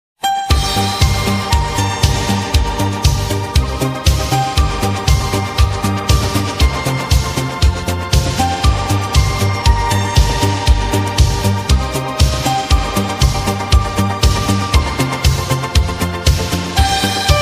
italo

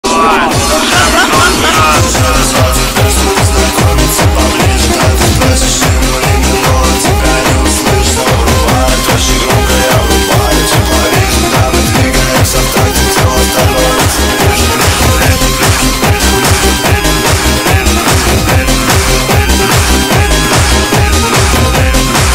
hardstyle remix

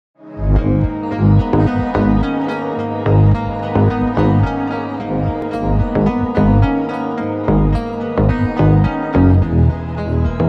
broken car bass music

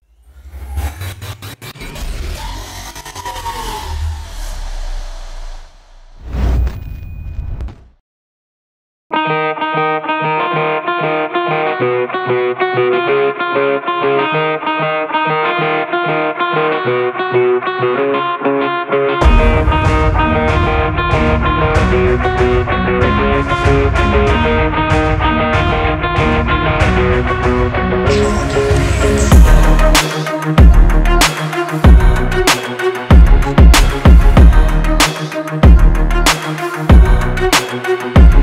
rock inspired rap beat hard motivational type

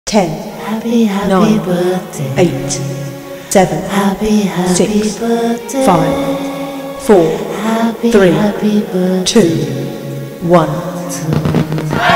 happy birthday to you happy birthday song

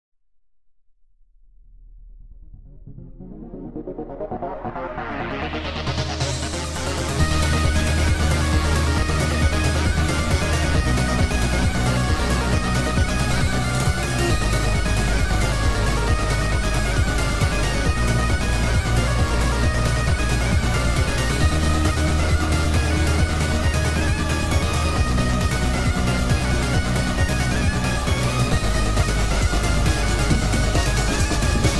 hallway crescendo; doors on budget ost

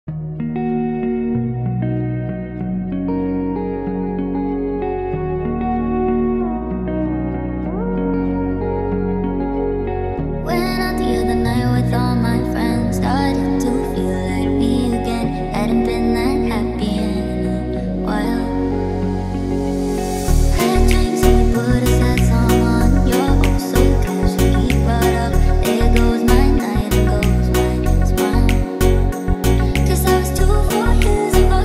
slow remix beat

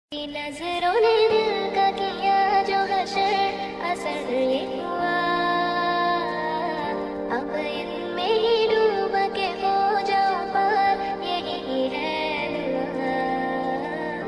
slowed reverb mashup song lofi

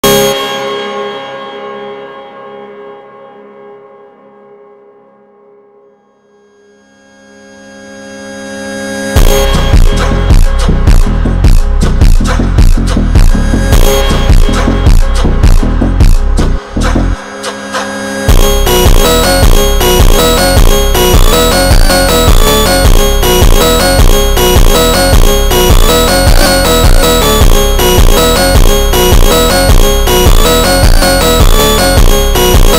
super slowed reverb brazilian phonk

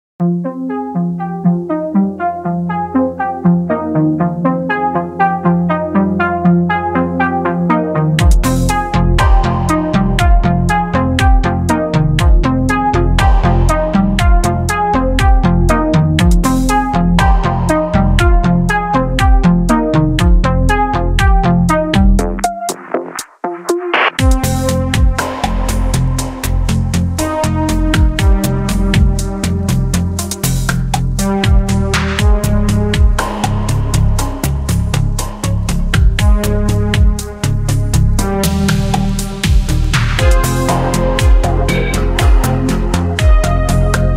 ambient music; ice scream 8 update

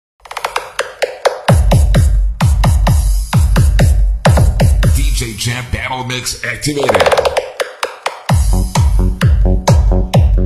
remix

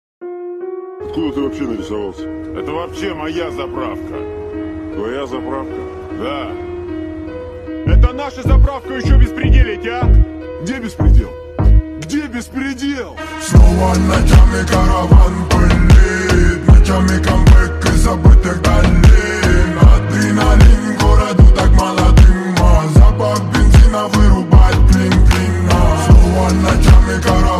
slowed bass boosted

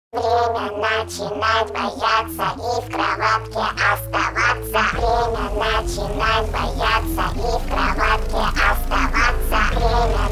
russian cover

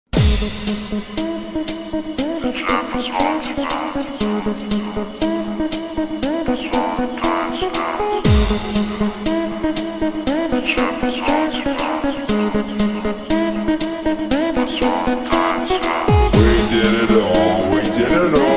slowed reverb

